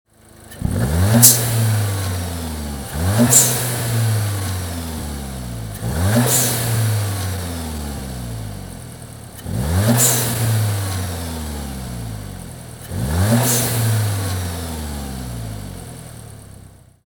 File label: electronic atmospheric dump valve Sound; MEDV106A